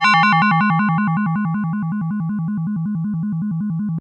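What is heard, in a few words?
FM Bell FX DX100 F#5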